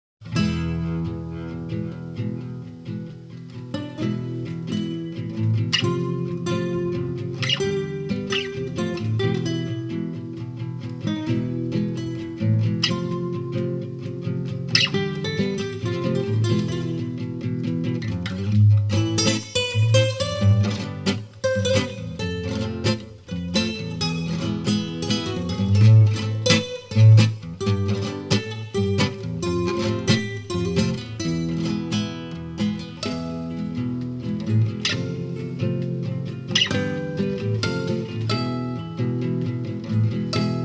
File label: pasodoble, guitare